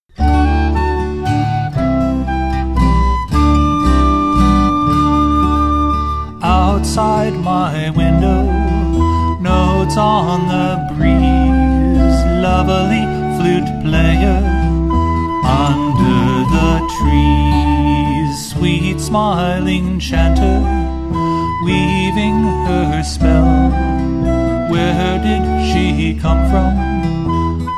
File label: Flute Player Sample